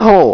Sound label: pain; wanted